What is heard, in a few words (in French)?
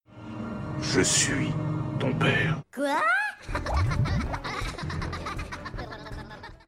minion rire; Je suis ton pere